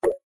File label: close